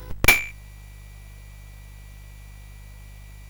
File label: CSG8580R5, GAMES; Street Rod